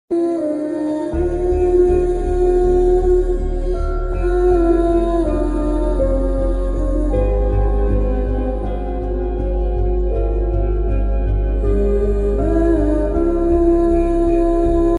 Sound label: background music; bajrangi bhaijaan; munni bhaijaan background music